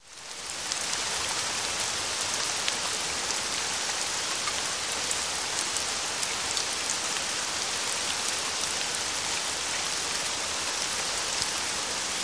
Rain Fall Steadily

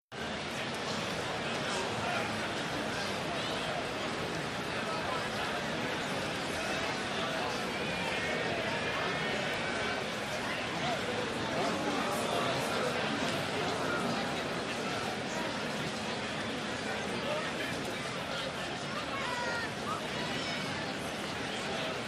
Football Crowd Stea, sports arena football

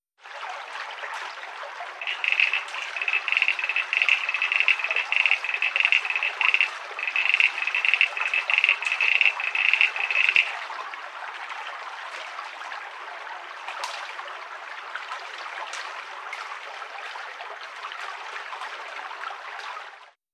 Frogs Croaking Sever

